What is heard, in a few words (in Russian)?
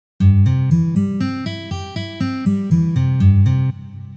Arpedzhio na gitare Do mazhor